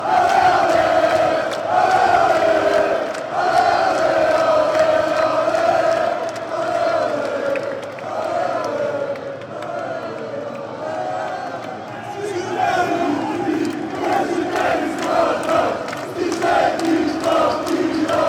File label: croatia chant